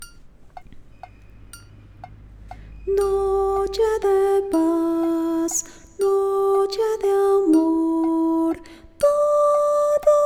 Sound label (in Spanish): Soprano Voz; Noche de Paz